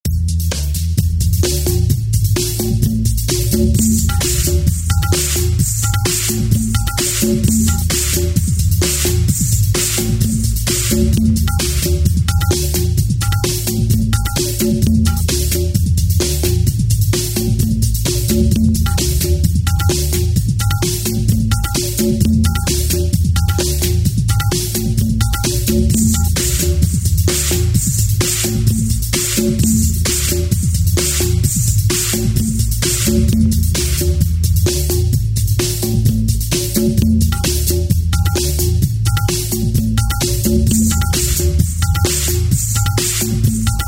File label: Bollywood Theme Drum n Bass DJ